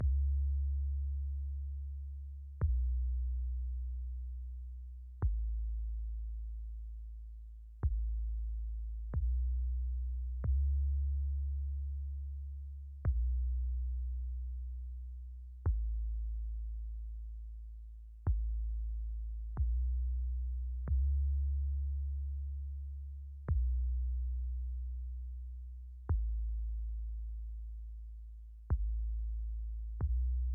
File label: Kick